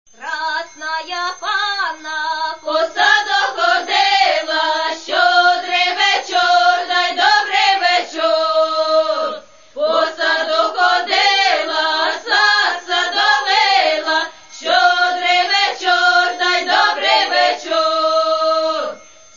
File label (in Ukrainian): koljadky